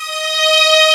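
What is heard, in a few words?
strings D#4, YAMAHA, Analog Fantasies; USB Soundscan vol.49; AKAI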